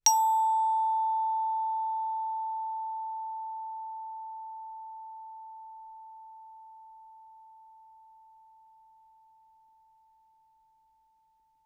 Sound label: bells plastic ff A5 stereo; Percussion